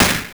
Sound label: Bang; M8; Genesis